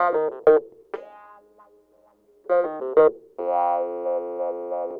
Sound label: Clavinet, 70s Analog Funk